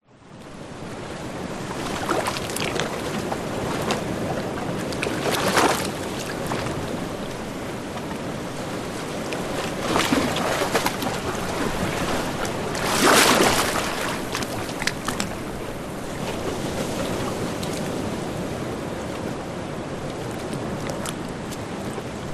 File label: nature ocean waves gentle small lap into rock pool distant surf; zapsplat